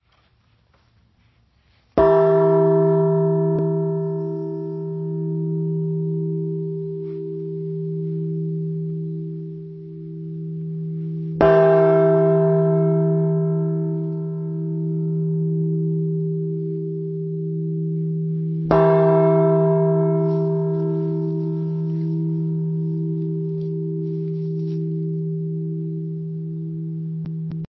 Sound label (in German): Tonaufnahme e1 1217kg